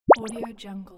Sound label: notification